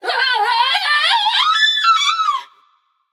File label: fear passion; chaac